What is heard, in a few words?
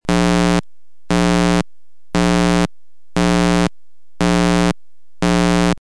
Double Buzz; signals